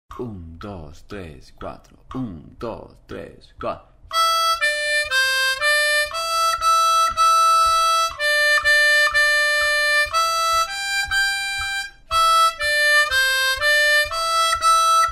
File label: Mary Had a Little Lamb 120